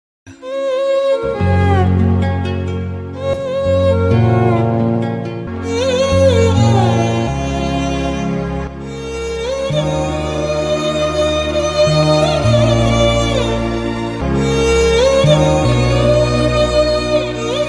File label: Background Music Ringtone